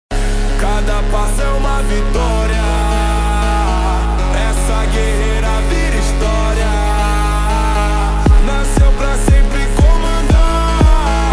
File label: Slowed, funk